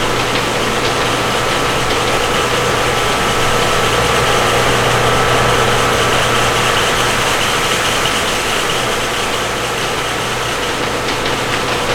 wall fan metal loop

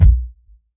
F-15 Cockpit Afterburn Stage In; DCS

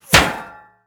metal bullet impact; Rust

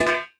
ui drop metal bars; Rust

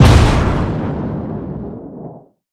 damage hits explosions; World Of Warships

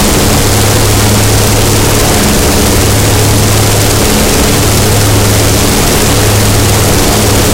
engine bomber close; engines; War Thunder

